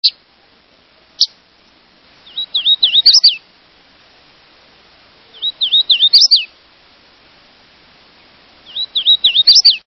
Hooded Warbler

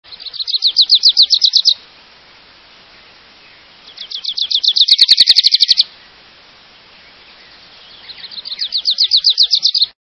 Graces Warbler

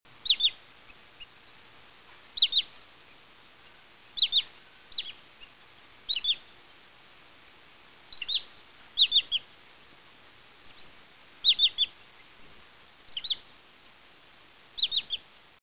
Wood Sandpiper, songs

